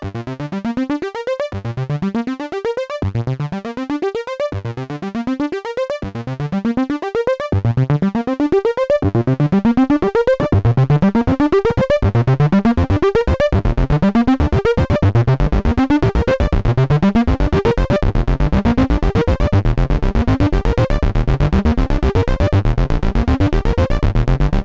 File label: DSI Mopho Keyboard Feedback